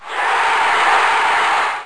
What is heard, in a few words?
goal; kick